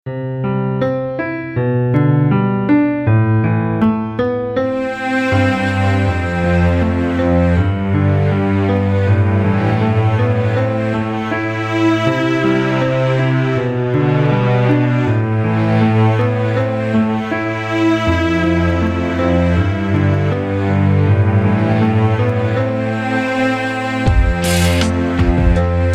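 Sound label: minus beautiful romantic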